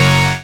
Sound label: Synth Shot; Vengeance Samples